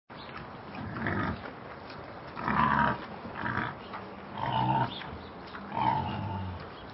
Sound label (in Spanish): jabali arruando